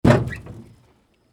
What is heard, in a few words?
metal row boat hit into dock